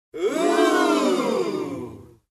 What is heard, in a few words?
Crowd amazed reaction